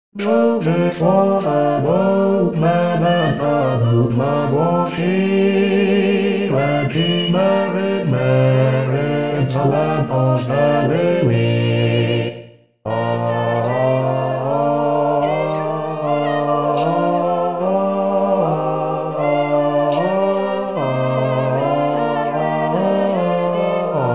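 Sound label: cherry tree Bass 1; Cherry Tree Carol; xmas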